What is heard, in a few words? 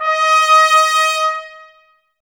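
trumpets D#, brass; 90 s Sample CDs; Miroslav Vitous; Woodwind Brass Ensemble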